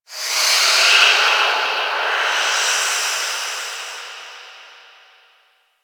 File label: Moon Wind Small, Ambience; Warframe; Orokin Moon